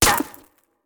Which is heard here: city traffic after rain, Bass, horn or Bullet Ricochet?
Bullet Ricochet